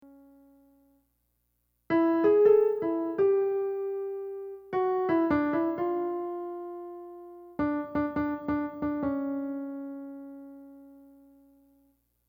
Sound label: Verse Harmony slower on Piano